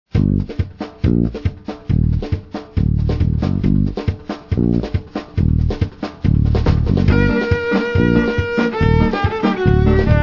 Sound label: ska